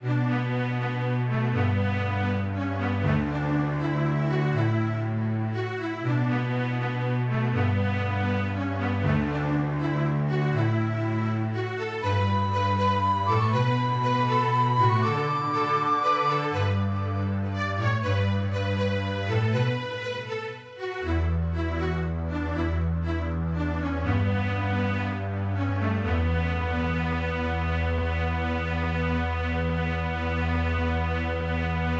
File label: music strings; Bejeweled3